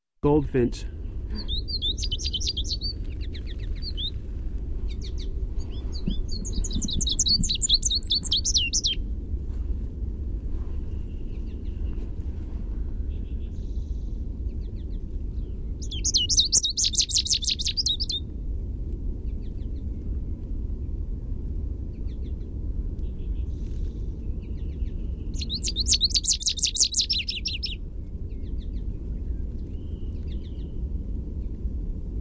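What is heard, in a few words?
American goldfinch song, Carduelis tristis; Passeriformes Fringillidae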